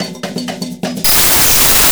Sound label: mix percussion loops, 125; PS-28 Vocal House Samples